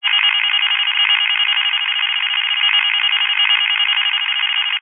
science fiction radio receiver transmission interference burst static electronic